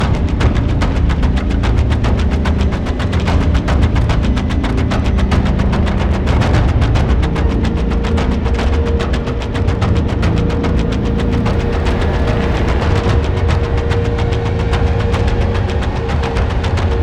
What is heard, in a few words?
Vine Chase Music Swamp; bramble the mountain king; ps4 ps5 switch windows xbox one xbox series xs